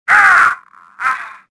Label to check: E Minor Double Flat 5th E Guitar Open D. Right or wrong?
Wrong